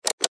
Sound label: Button Switch; Multimedia Web Interactive; Technology; Blastwave